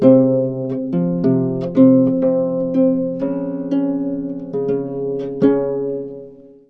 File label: LUTE